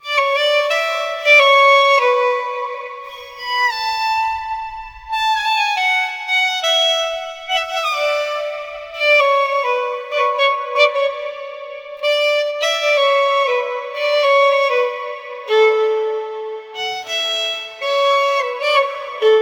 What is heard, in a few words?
compose a pure solo violin, violin